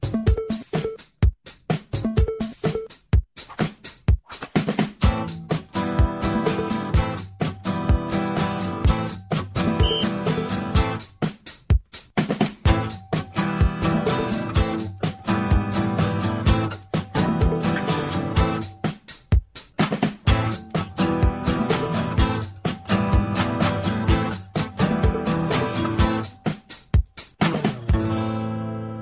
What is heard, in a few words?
GUITAR BEAT